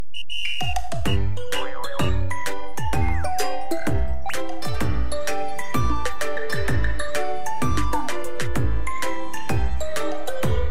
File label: bgm